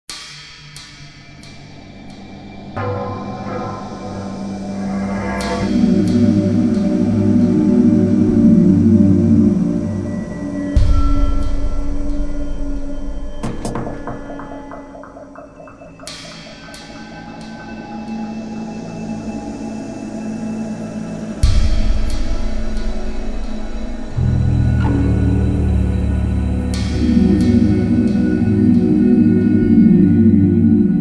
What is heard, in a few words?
suspense